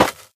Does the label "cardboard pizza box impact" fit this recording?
Yes